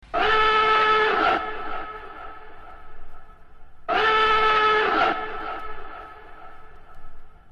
Toooot

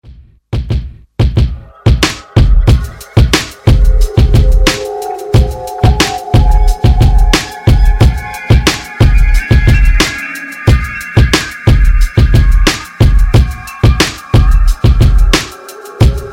Hip Hop Beats

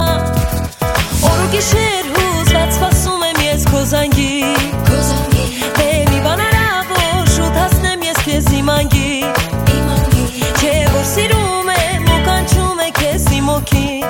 Armenian

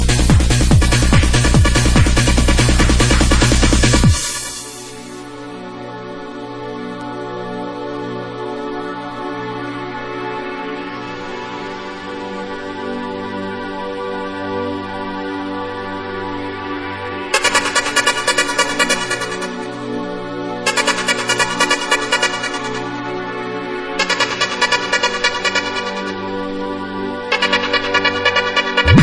Nice Techno Sound

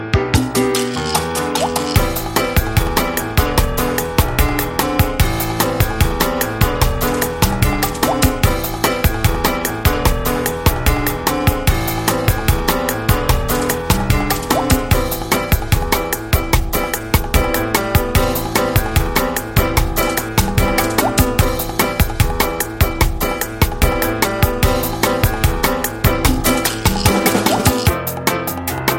Elevator Music

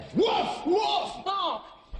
Woof Woof Bark

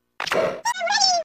Plucked; Pikmin; Plucked 3

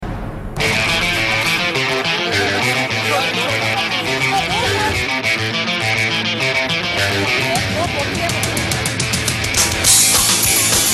Rockin

